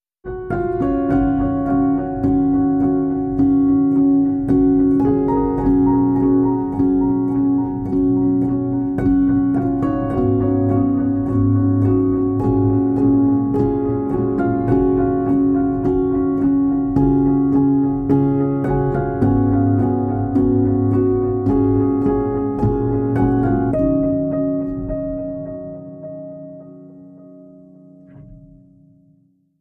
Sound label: Piano Only